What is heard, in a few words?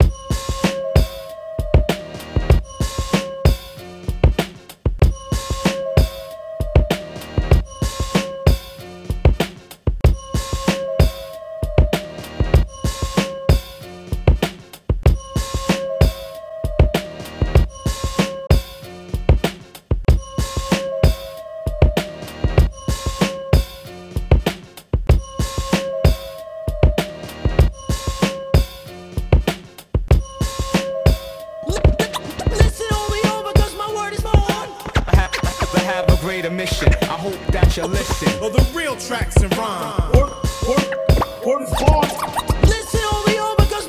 8 bar instrumental w cuts; wibrapradio